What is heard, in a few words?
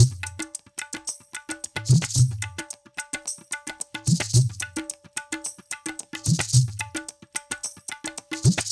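110 D Tabla Ensemble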